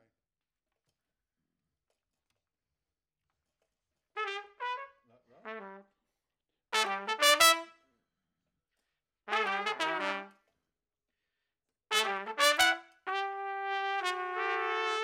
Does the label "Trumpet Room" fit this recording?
Yes